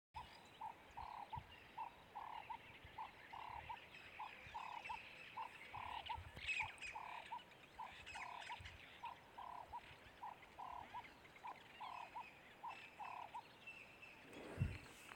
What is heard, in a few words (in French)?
tourterelle du cap, oiseaux de mayotte; oiseaux terrestres; faune de mayotte